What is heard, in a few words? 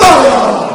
learn to fly